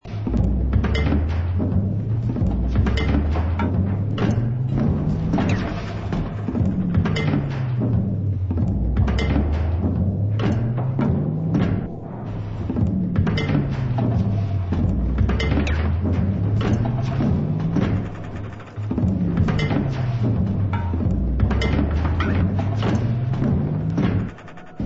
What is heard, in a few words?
Percussion